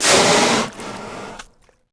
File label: Underwater exclamation; windows 98